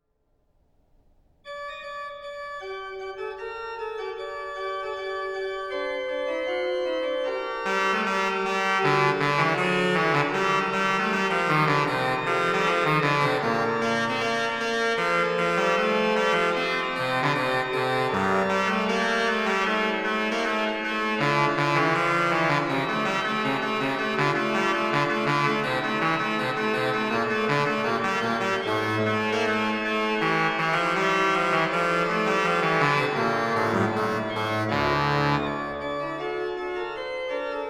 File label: Basse de Cromorne; frechilla; Clerambault